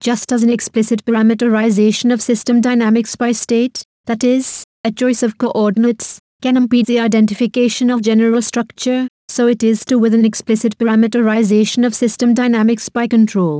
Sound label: Veena